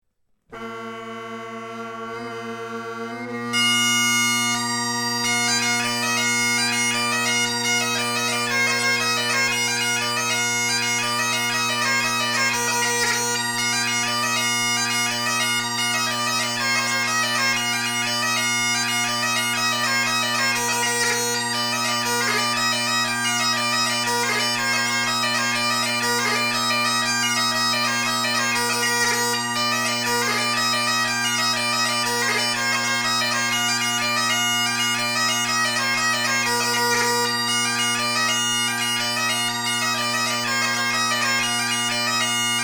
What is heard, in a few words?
jig